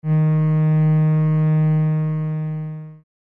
cello b2

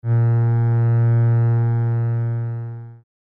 cello fs2

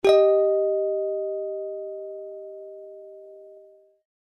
tones string a tone g48

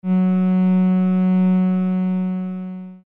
cello d3

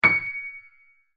loud db7, piano

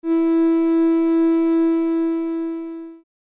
cello C4

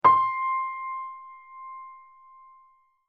loud c6, piano

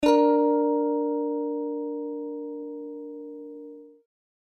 string a tone d48

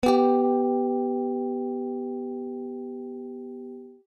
string a tone b38

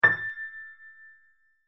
loud a6, piano

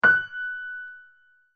loud gb6, piano

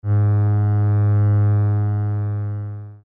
cello e2

